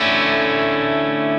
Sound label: Dmaj9